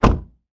transportation car door shut external; sound effects one

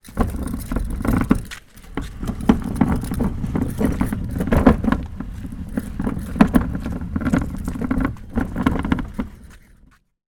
bin wheelie pull concrete ground; household; zapsplat

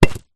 brick down on tarpaulin; zapsplat

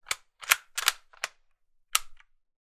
gun karabiner k98 rifle cock dry fire; sound effects one

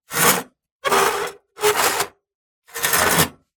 Just Metal Scrapes and Scratches; soundbits

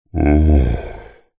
fantasy giant male groan pleasure

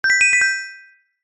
multimedia game sound gem treasure found

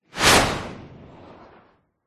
warfare missile rocket small launch fizz whoosh

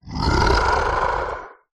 horror creature monster growl single; zapsplat

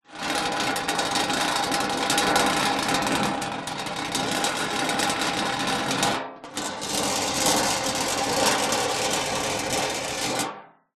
foley metal tray drag scrape concrete; zapsplat

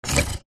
vehicles car hyundai trajet heater temp dial turn; 2006

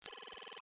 Interface Beep Confirmation Hi Tech Sci Fi; lesser vibes

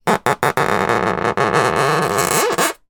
polystyrene block rub finger squeak; foley; zapsplat

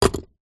foley brick drop on concrete block; zapsplat

